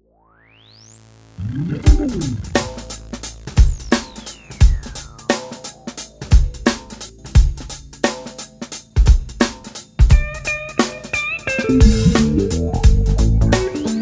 Real Funk

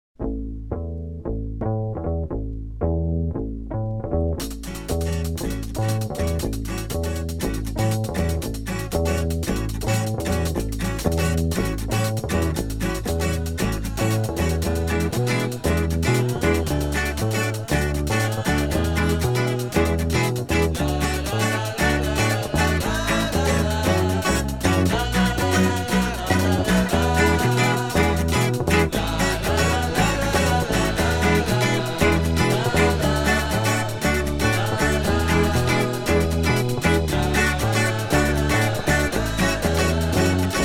Samba

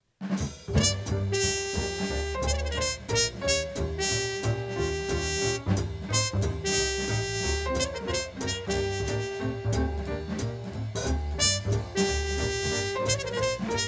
blues

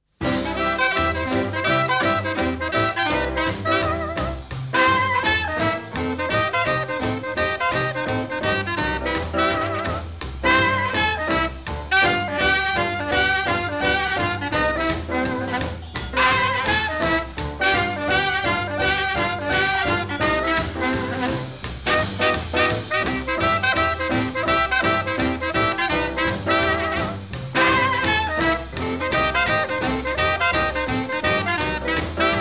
Stomp

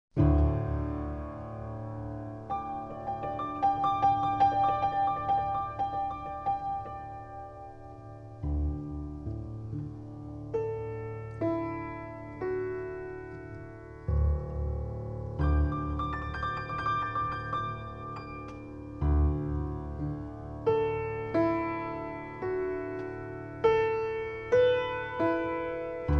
piano

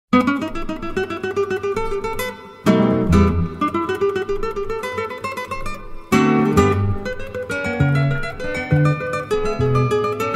Tarantella

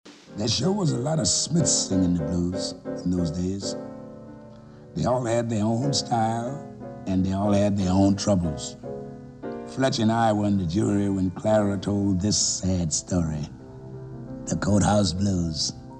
BLUES

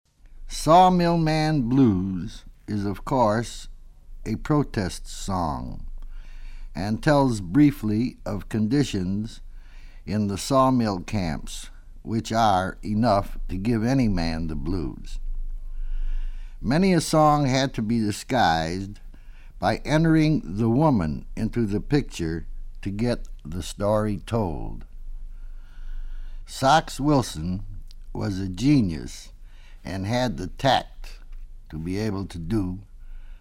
BLUES SEPTET

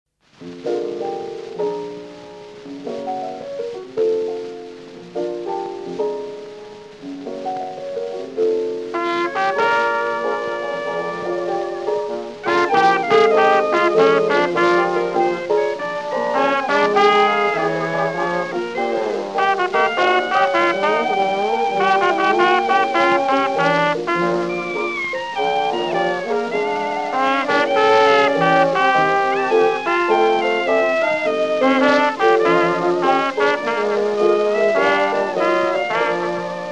blues

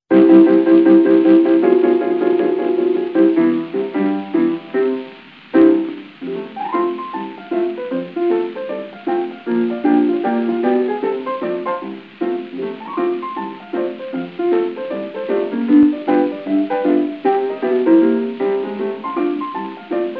blues

